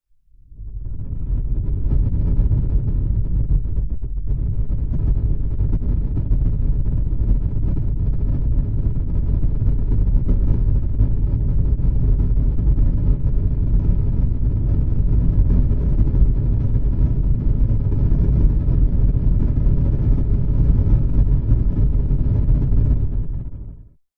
nature fire flame large burst

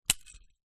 mouse trap snap set off; animals

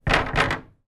household gate wooden close

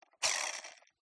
toy car rc wheel grip spin manual; Skyclad Sound

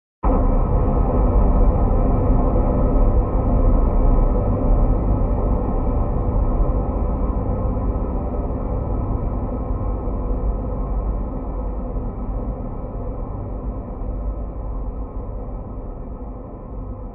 horror hit long dark clunk sinister

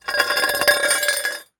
Just Metal Scrapes and Scratches; soundbits

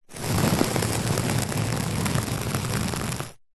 small sharp spike single long scratch carpet; foley

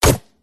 weapon gun gunshot futuristic; PMSFX

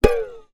cartoon loose grip let go fall; zapsplat

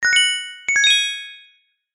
multimedia game sound gem treasure found

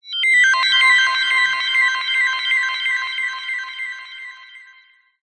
sound design magical beeps delayed speed increase

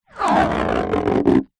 balloon popped skin rubber stretch squeak

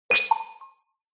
science fiction control panel beep alert

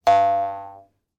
cartoon spring bounce twang

